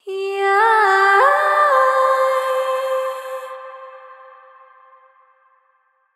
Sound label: Vocal Swell